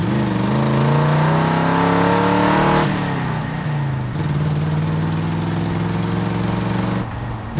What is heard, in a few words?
door move; Golden Crayon Sounds